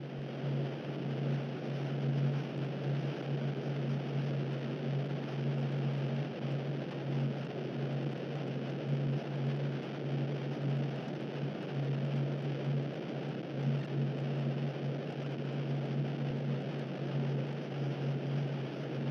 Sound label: drone; Dreary Drains